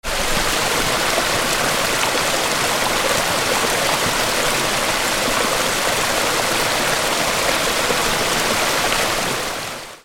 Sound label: WATER